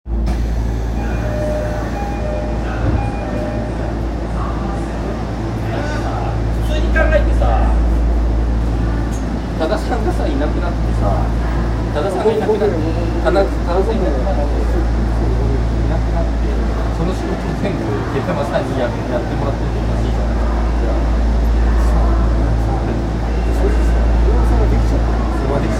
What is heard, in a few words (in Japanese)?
丸ノ内線中野坂上東